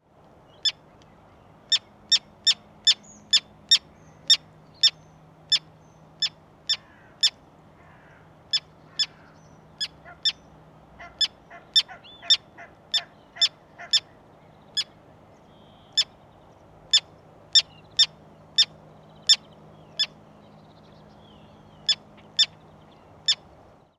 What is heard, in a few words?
Common Tern Sterna hirundo